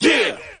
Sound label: "yeah" chorus